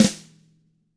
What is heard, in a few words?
DW FLM1 FD-R, DRUMS SNRD; ILIO; Double Platinum Drums 2